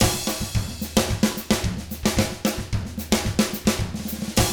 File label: 110 SAMBA, Drums; ILIO; Double Platinum Drums 1